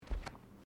Footsteps Bare feet Carpet Step; Footstep Foley; Human

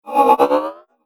Future Vroom, whoosh sound effect; Future Vroom 14

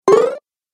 Retro Videogame Fx Jump Boing

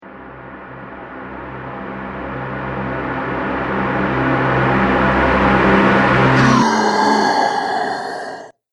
Radio Stinger

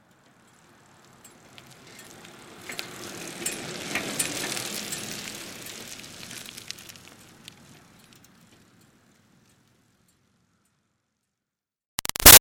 Bicycle Retro Cruiser By Med Pedaling; Bicycles; Vehicles; Big Room Sound

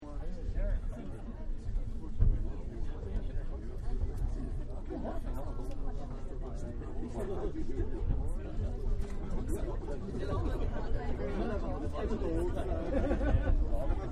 Tokyo Crowded Train Car; Trains; Vehicles; Partners In Rhyme

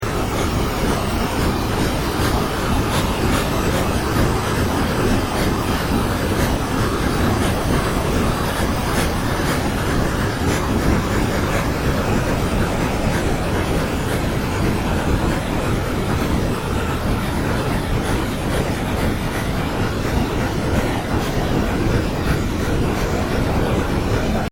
Ambient Drone Ghost Chatter

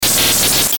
Glitch Hiss Fast Warble; Production Element Imaging Element Accent Transition; Blastwave

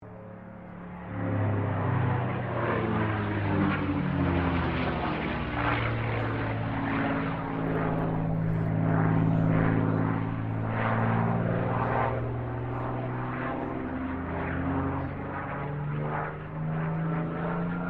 AIRCRAFT CAC CA-18 MUSTANG MK23 LOOP; CA-18 /P-51 Mustang; Airplanes; Vehicles; Aircraft Collection; Twistedtracks

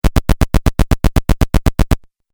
Footsteps Video Game

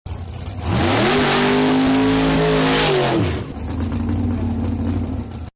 Hot Rod Burns Out, car; Partners In Rhyme

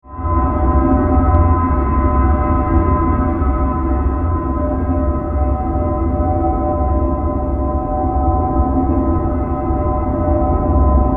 Power Drone, Spaceship, Science Fiction; 5.1

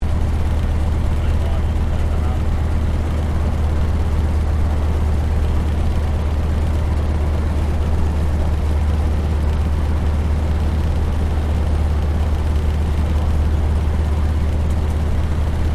AIRCRAFT CAC WIRRAWAY IDLE COMMS INTERIOR STEREO; CA-16 Wirraway; Airplanes

